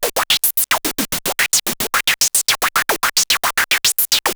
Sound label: MiniBrute 110BPM, Gated Seq Noise